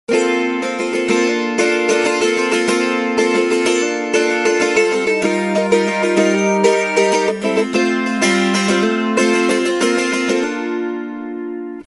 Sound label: TIPLE